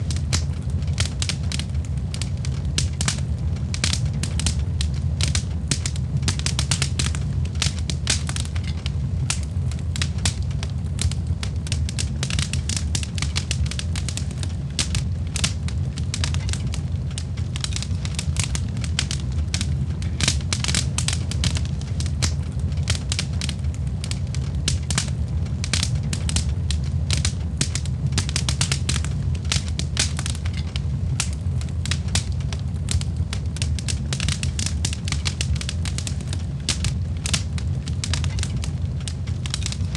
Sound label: Crackling Campfire; Rain Sounds